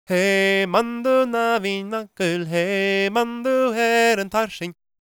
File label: GAELIC, World Voices; ILIO; Vocal Planet